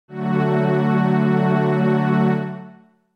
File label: F# D Major